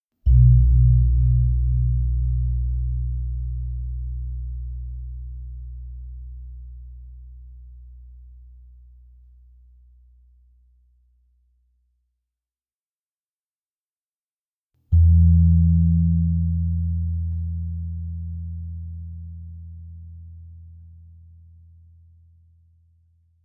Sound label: suwukan, gamelan; sounds of mtb